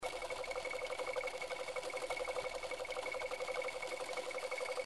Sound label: Reel Spin; Halloween Blast